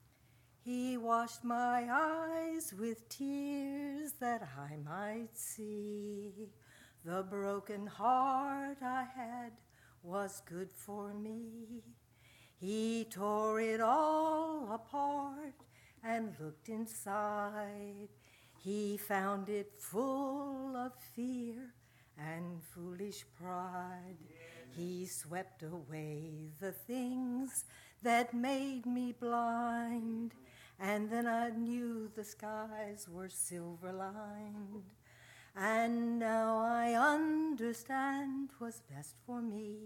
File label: singing